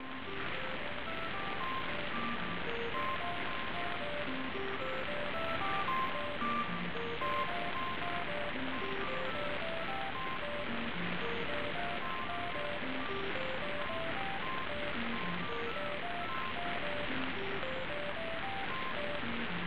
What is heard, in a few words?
Gentle Falling Rain